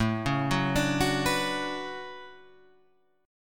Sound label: D Major 13th A, chord